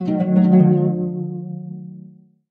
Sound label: defeat lyre strum; smite; Apollo